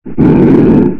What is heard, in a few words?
float; custom monsters; plagueskull